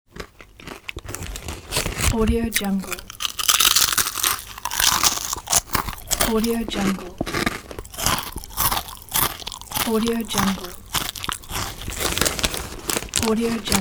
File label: male eating potato chips